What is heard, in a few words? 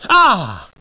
pain; svencoop